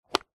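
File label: leisure remote control car remote switch click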